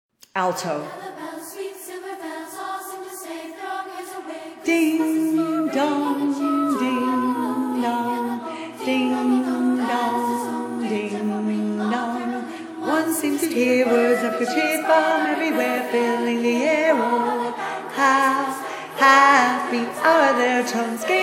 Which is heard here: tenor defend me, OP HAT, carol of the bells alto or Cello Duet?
carol of the bells alto